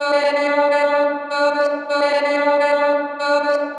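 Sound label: chopped vocals female D minor 128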